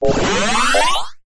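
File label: science fiction cyberpunk robot surge power short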